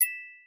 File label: success